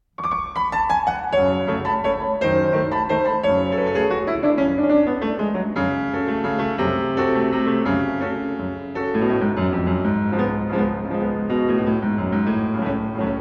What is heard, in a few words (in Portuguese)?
Valsas de Choro